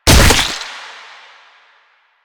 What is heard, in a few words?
Proto Infested Ancient Footstep; Warframe; Ancient Ninety Nine